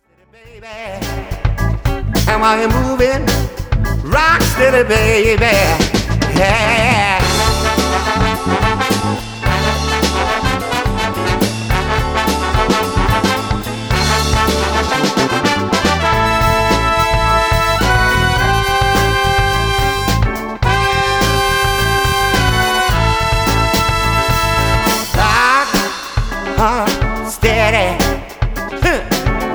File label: Brass